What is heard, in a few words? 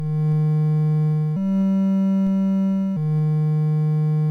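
Magicians Ball, MOS 6581 SID chip music, games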